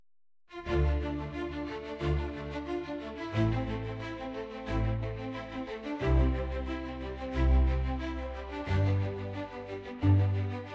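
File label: symphony of triumph, military music without words; voennaya muzyika bez slov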